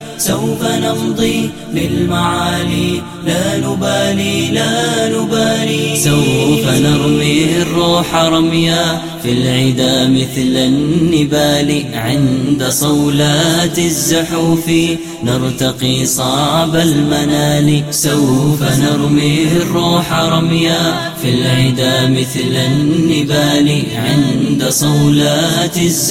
Nasheed; Jihad

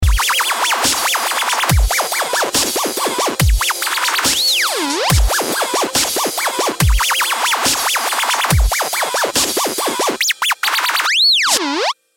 Dj Scratch

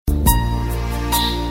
Heart Beat

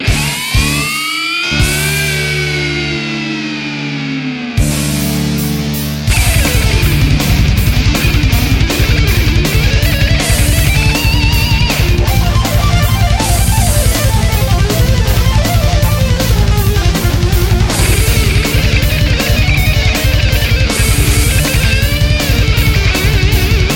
Fast Dusk Rock

